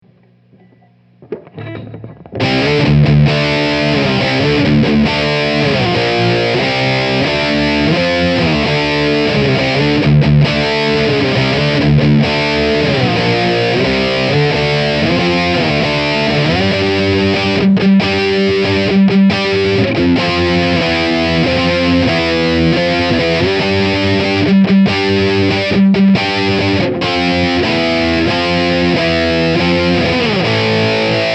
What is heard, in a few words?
DSL Stock Lead, Marshall; Marshall DSL Transformers